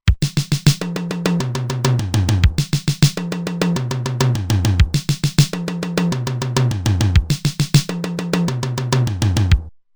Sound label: Fill In 4-4, Roland TR-505; Factory Rhythms